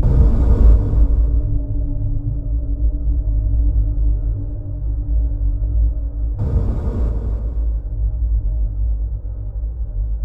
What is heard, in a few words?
tension, ambience music